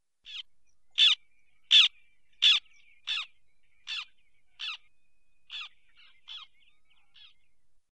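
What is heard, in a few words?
white-winged tern voice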